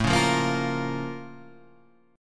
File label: Bb Minor Major 7th